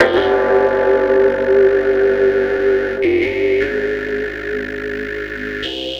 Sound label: Dark Bell Tolling